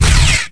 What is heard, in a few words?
phaser start; weapons, phaser